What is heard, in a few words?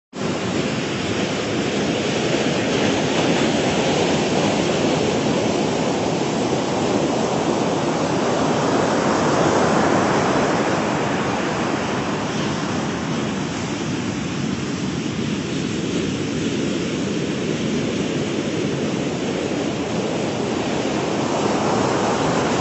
Beach Waves and Sound of Surf